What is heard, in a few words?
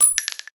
sg eject, sentry; buildable